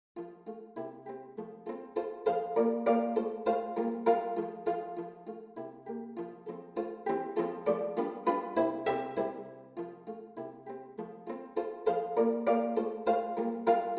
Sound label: Pizzicato for viola ensemble; Gluck